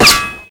metal solid hit; projectiles; bullethit; Max Payne

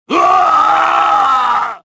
death; kyle

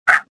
jump; Star Wars Jedi Knight II Jedi Outcast; XBOX

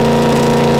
MID, Engine; Cars; KING

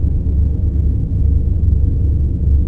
interior, ambience; doomgiver

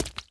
footstep run water

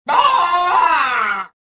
death, rodian

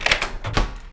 door lock; Max Payne

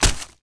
sword hit body; impacts explos

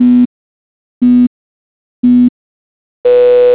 start beep, Cars; XBOX

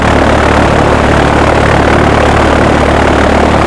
prop; Kenworthy

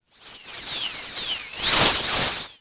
magic; The Elder Scrolls III Morrowind GOTY; XBOX

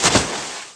foley slide; skater; Tony Hawk's Project 8; XBOX

